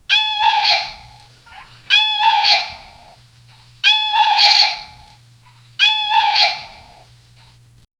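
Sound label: smoke filled panicked cho, coughing; danger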